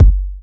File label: Kik; Rawcutz - Nu Forms